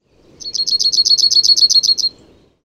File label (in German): zaunammer